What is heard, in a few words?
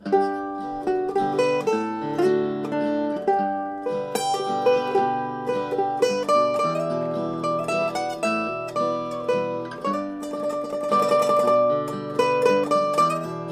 Waltz, Mandolin M35